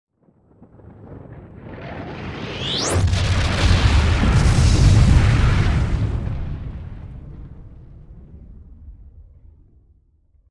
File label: Fire - Rush in w high pitch whistle then huge explosion; Hollywood Edge Sound Designers Tool Kit